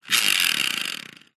rc car wheel force spin by hand clicks

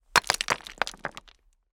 impact rock large rockpool dry

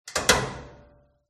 close door clothes dryer; fox audio

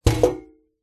household stool chair set down tiled floor

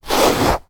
human brushing hair long single brush; sound effects one

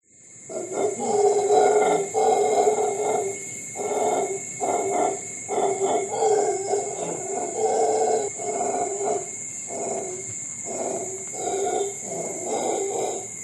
animals monkeys howler insects costa rica

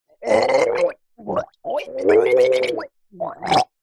horror male chock gag gargle in own blood

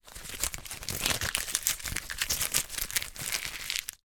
industrial masking tape strip scrunch up

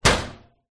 industrial metal cabinet door impact close hard; zapsplat

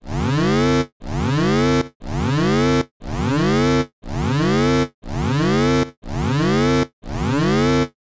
science fiction danger alarm

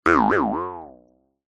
cartoon boing squirrely jaw harp; zapsplat

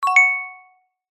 multimedia notification bell glassy chime

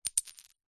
glass small disc set down concrete

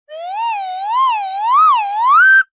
cartoon slide whistle double pitch ascend

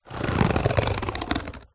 foley cardboard scratch fingernail long

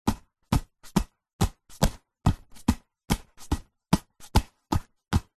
soccer ball juggle between hands; zapsplat

